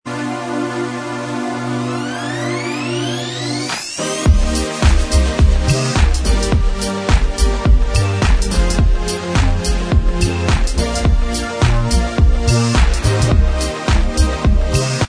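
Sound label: instrumental, backing tracks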